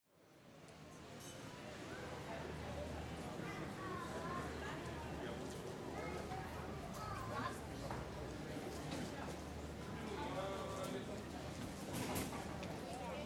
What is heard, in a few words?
Surround airport no calls Stereo Downmix, Airport Departure Lounge, No Calls - Light crowd; in an airport departure hall